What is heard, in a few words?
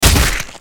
damage; gwyn